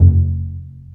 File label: Cb Pizzicato; STR; Roland L-CD702; Cb Pizz 1